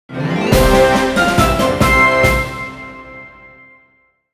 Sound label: Jingle Clear Stereo; Shin Hyu Stone; 3DS